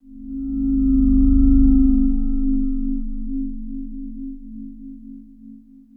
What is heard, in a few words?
Drone